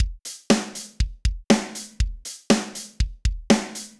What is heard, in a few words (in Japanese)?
ドラムセット